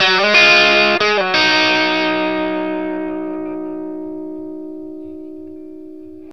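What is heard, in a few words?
BLUESY G sharp 60, Vintage Blues Guitar; USB Soundscan vol.22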